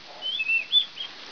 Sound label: rat